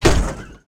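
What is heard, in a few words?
metal hard, Impact; Cars; XBOX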